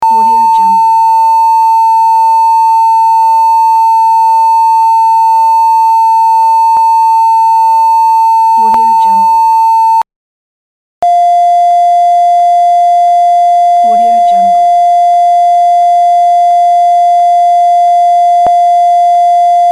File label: censor beep